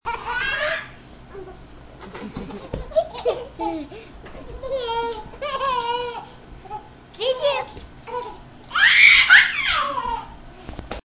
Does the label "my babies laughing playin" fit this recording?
Yes